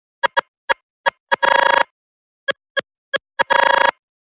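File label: shake, nothing phone sounds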